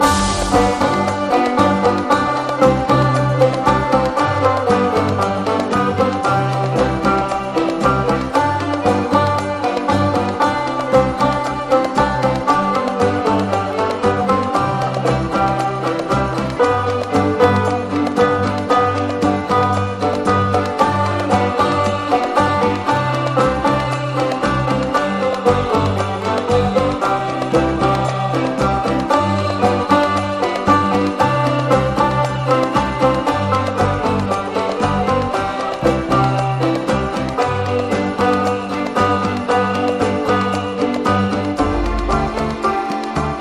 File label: TRUMPETS; MONTPARNASSE